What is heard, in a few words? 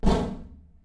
thwump right; stereo via phase of 0.004s